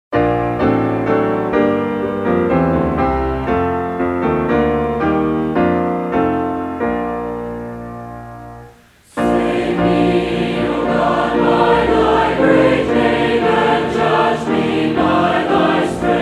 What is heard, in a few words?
hymnal, chorale; Church Materials; AC Chorale; Save Me, Oh God By Thy Great Name